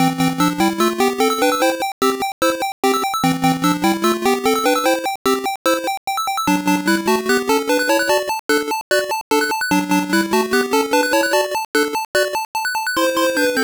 Minigame BGM; Issho ni Hashirou Kikansha Thomas; Pico gamerip 1995

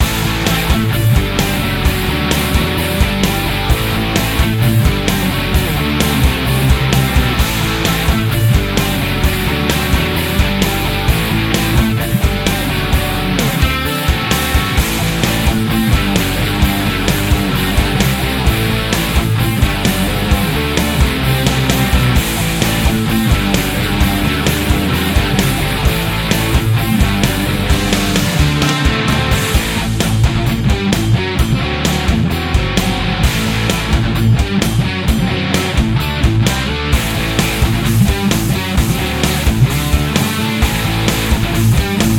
Race Loop; zombie derby 2

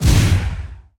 Card tap; Zenonzard

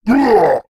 Creatures Fight Damage; mighty party 2017 gamerip pc ios android

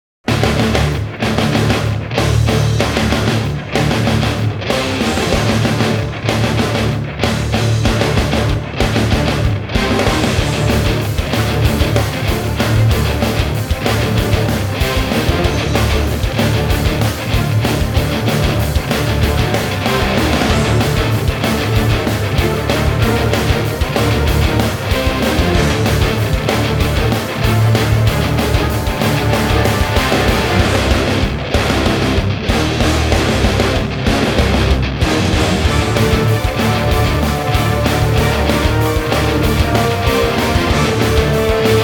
Action High; Emergency 5

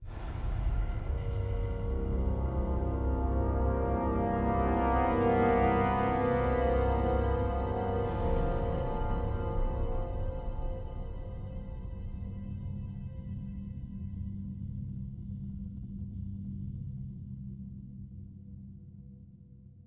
Riser; N.O.V.A. 3 Near Orbit Vanguard Alliance

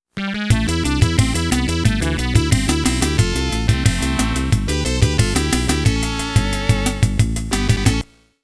Game Over, FM Sound; Monster World Complete Collection; Monster World II

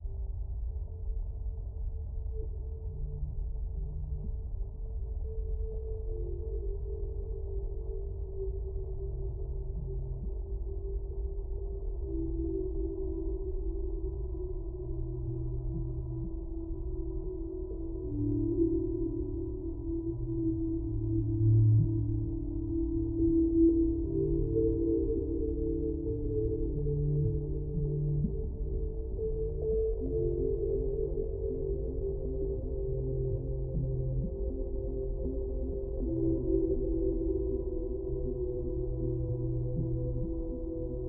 Whale Explore

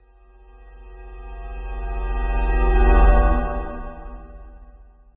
Swell

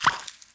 Pop

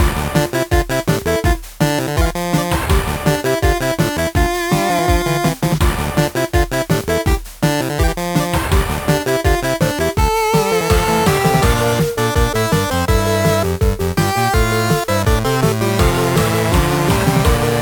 8-Bit Final Boss; Project Laser; Brawl Stars; 8-Bit Clone

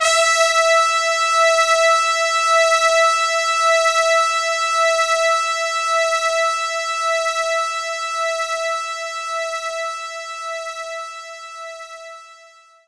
Brass Section E5

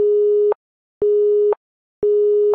Phone cut; Zenonzard

